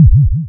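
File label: waveform FM sine sine envelope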